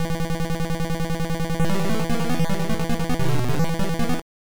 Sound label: Game Start; Gyrodine; NES